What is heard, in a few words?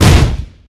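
grenade explosion; AssaultCube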